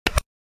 Modern Ui

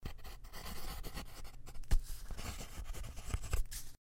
writing; The 39 Steps

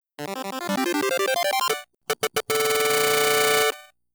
Fanfare; Title Acquisition

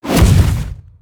Cannon Explosion; helltaker

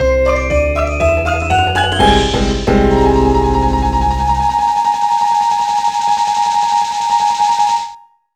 Transition; Clavy

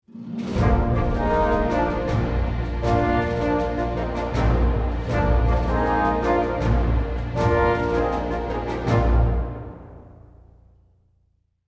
Character Introduction; Aces of the Luftwaffe Squadron; PS4; Windows; Xbox One

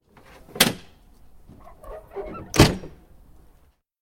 Train Door Open and Close

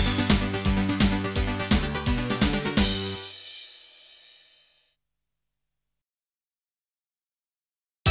Level Start; Alfred Chicken; CD32; Amiga

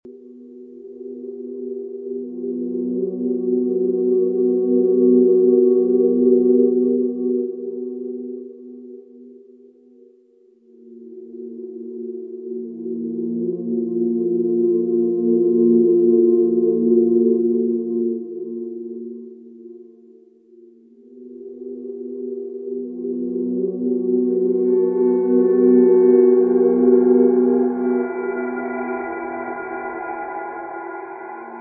Ambience; Mace Griffin Bounty Hunter; ps2 windows xbox

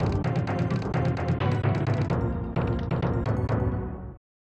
Fanfare; Mahjong Touhaiden; SNES

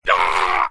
enemy dead; Arcane Quest; android game

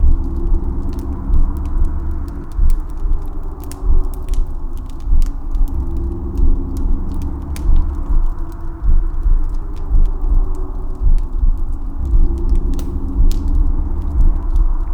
Effect Combustion Dark Loop; Lucius III; pc linux

